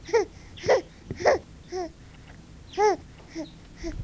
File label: Homo sapiens